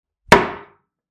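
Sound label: door slam; hlopok dveri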